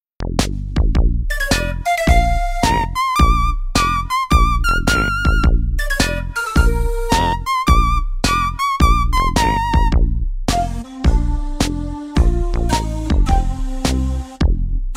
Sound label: instrumental; oral language kit